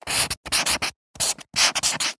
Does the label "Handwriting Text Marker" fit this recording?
Yes